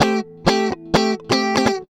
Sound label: GUITAR LICK; Ueberschall; Jam Box